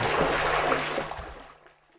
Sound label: wade; Ace of Spades